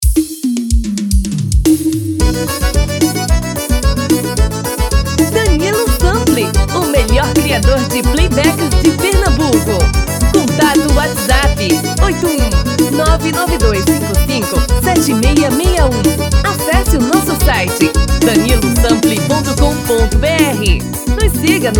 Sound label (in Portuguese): Forro das Antigas